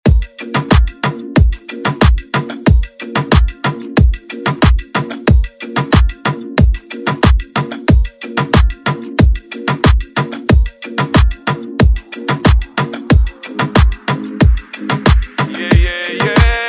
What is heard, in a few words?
Moombahton Redrum